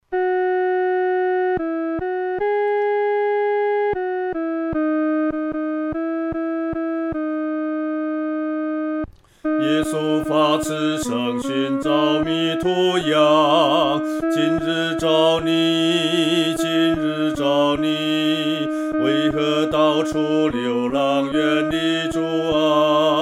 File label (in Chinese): 独唱 第二声